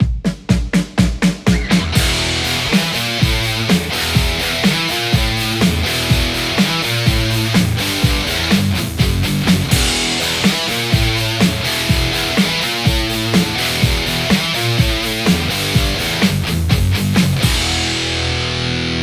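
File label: Stratocaster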